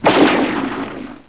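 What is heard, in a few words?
ricochet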